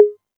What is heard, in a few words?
808 Conga Hi; Novation Drumstation